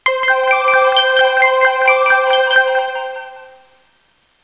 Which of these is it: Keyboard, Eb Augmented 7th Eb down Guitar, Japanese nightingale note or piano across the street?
Keyboard